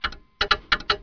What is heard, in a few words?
household